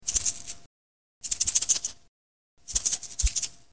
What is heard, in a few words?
maracas